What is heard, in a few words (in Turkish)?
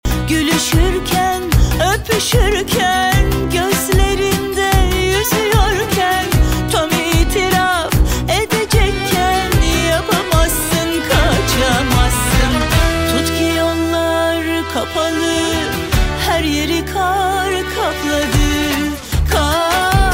vokal